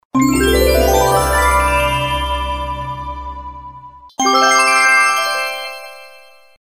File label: sms